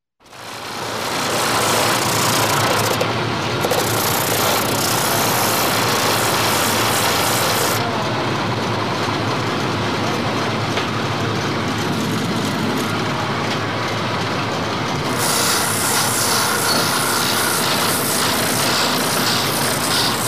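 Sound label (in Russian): производства обуви обувная мастерская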